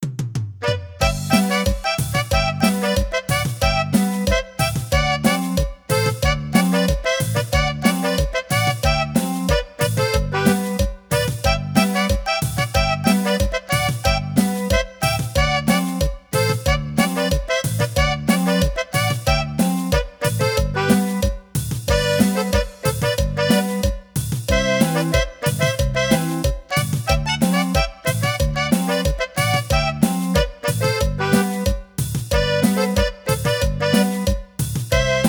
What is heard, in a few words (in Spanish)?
Cumbia